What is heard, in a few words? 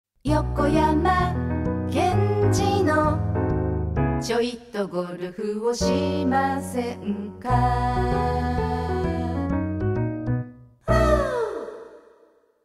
jingle